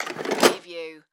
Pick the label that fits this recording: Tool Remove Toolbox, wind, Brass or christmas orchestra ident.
Tool Remove Toolbox